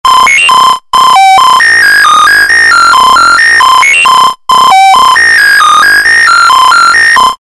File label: ANALOG Sid, synth; mfb synthII